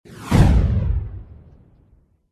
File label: snake buff; pet